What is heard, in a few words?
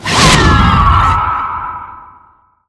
scream of pain; queenofpain